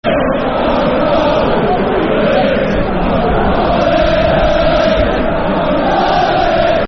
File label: We're Leeds And We're Proud Of It Football Chant Soccer Song; fanchants; Leeds Lufc